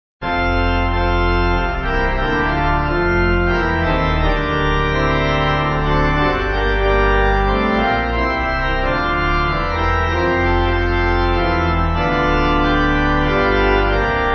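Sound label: Pipe, hymn backing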